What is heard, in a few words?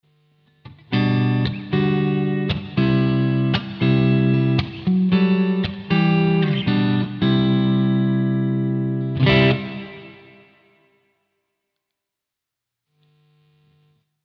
amp A reverb plate; Simlpifier